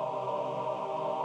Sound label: reel spin; netent; bloodsuckers2